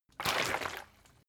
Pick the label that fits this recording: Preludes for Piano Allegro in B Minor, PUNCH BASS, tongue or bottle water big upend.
bottle water big upend